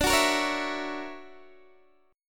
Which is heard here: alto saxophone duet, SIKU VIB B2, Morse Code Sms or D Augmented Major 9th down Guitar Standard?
D Augmented Major 9th down Guitar Standard